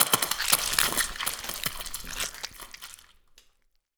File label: post grenade fleshy debris, violence; Benny Placeholders